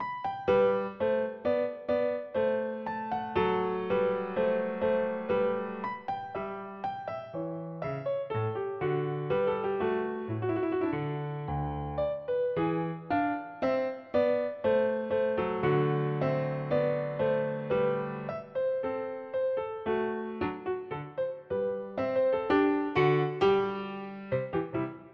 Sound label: mozart Steinway; mozart kv1e